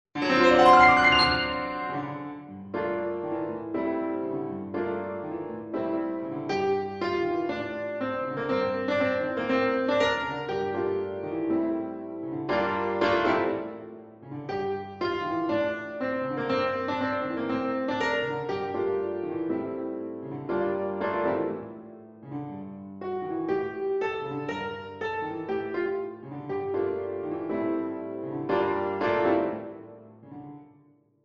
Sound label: Wagtail, Piano